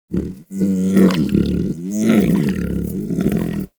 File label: Vocal Snore; Warframe; Predasite; Hunting Critters; Characters